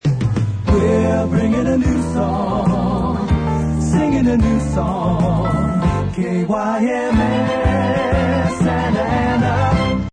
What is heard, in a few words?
Jingle Bringin A New Song short; KYMS